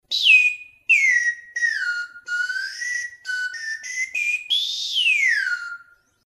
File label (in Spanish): flauta embolo